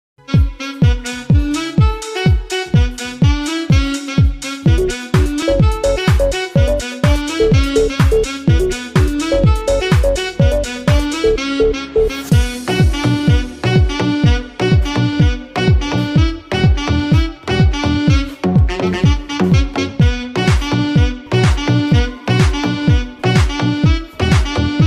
sax melody music ringtone romantic saxophone ringtone instrumental music